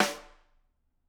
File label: Rim, drum